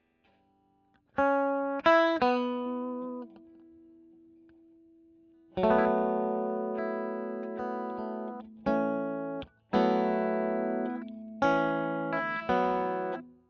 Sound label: E. GTR Royer